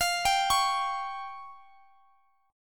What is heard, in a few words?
F Suspended 2nd Sharp 5th F down Guitar Drop D